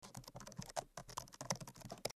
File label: keyboard